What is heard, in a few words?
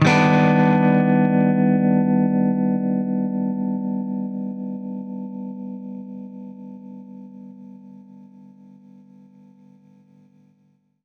Baritone Chord Cmin9[maj7], Guitar Chords; undercover samples